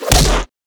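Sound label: Battle Book; Etrian Odyssey III HD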